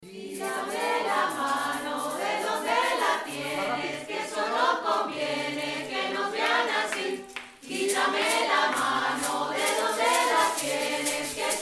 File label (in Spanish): quitame el mano solo voces